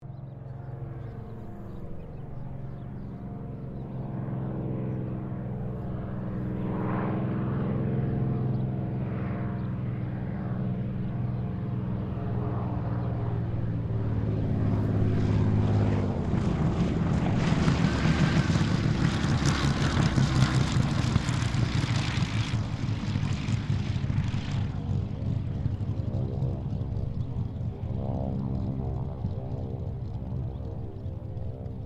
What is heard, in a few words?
AIRCRAFT SUPERMARINE SPITFIRE MK-XVI LAND; Airplanes (Sound Effects:Vehicles)